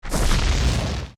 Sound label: Deku Sprout; zelda; OOT